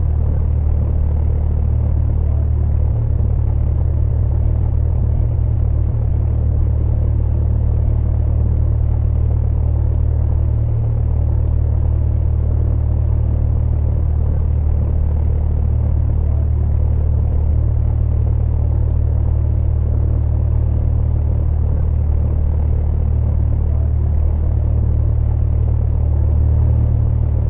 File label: airplane motor; LOOP; anemoi